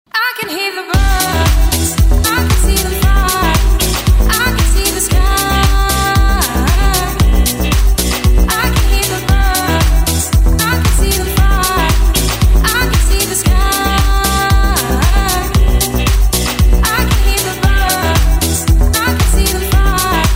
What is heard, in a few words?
Ringtone Mobile